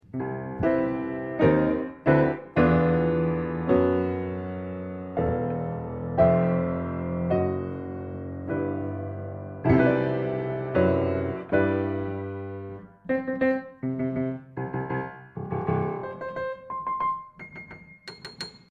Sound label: piano mic test